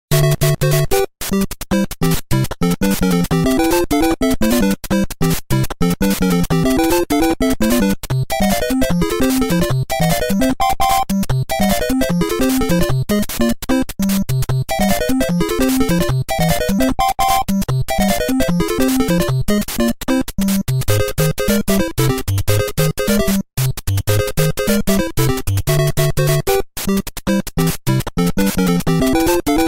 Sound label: Overworld Hurry Up; SMB